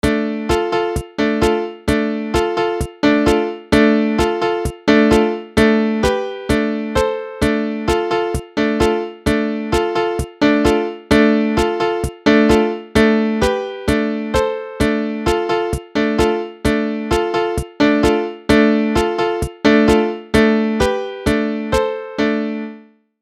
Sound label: People W Drums